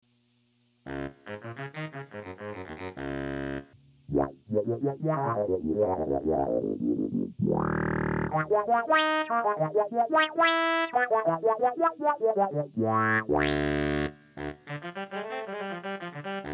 envelope follower synth baritone

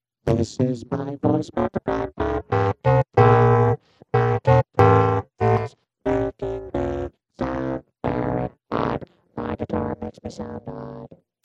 Guitar X Vocal, ring modulator; analog synth

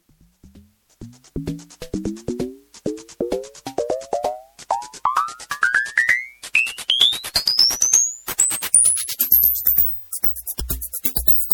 ring modulated drum; analog synth; ring modulator

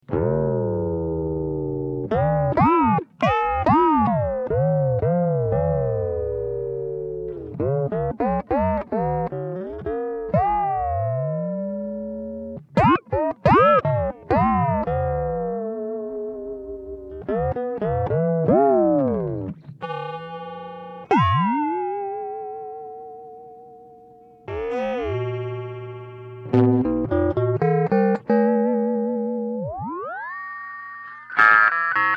guitar and multiplier and ef; analog synth; ultimate expander

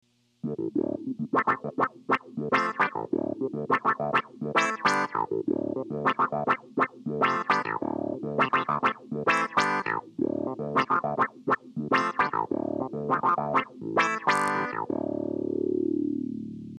envelope follower synth clav

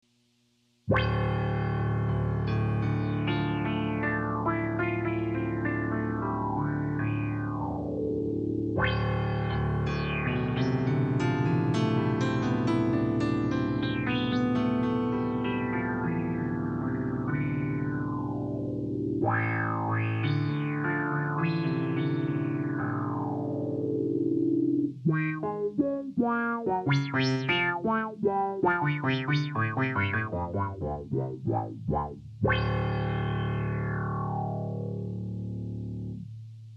envelope follower synth piano

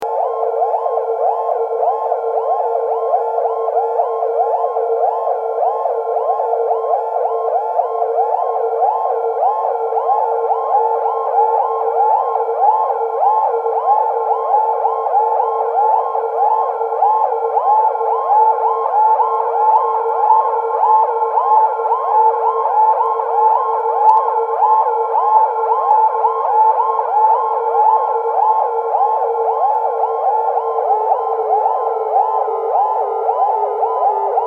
Double Wherrr Ooooh W Reverb; analog synth

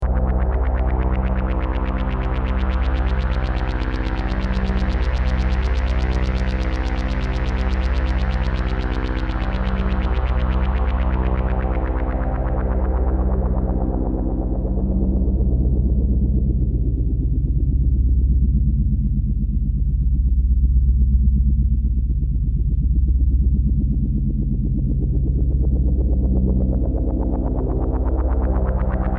full res aadsr lfo; lp vcf; analog synth

